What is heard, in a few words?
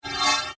Scanner Beam Short, Scifi; Science, Fiction; Tech